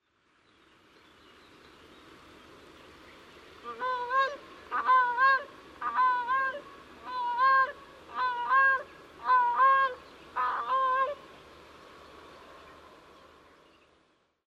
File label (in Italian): Gru coronata; Grey Crowned Crane; Balearica regulorum; Gruiformes; Gruidae; call; Busatello, Verona, Italy; Marco Pesente; Verona BW